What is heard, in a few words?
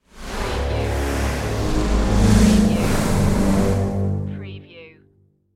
Dark Production Whoosh